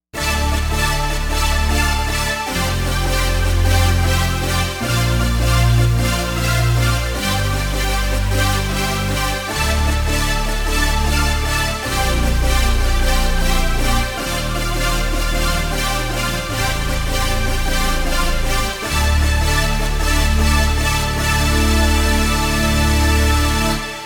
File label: super saw; Jupiter-80